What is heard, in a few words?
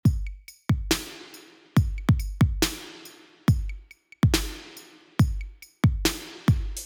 Gated Reverb OFF